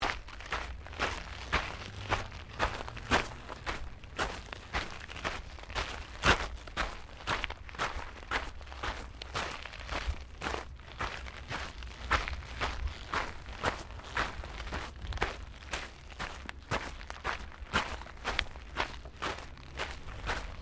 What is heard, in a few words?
Footsteps Gravel Sequence; Crocs; Footstep Foley; Human